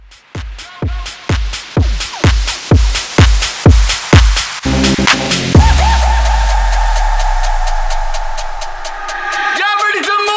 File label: Vocal 127